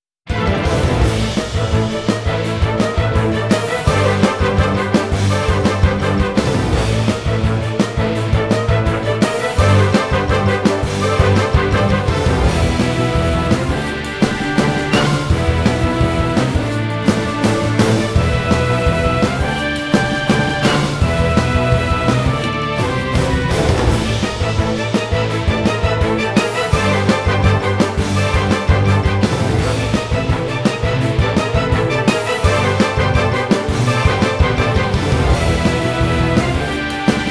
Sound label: Dragon Prog Rock